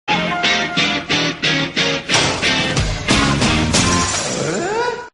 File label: jingle; home-improvemnt